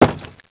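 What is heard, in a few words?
body panel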